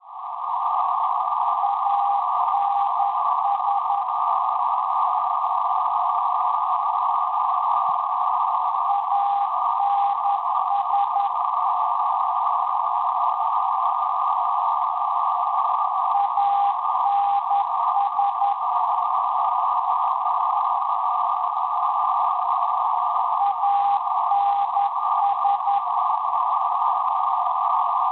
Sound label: NDB Ansbach Germania 452 kHz; Schwarzsee